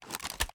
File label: inspect